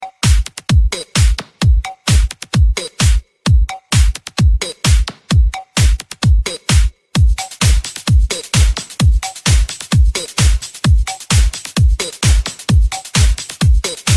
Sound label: sick beat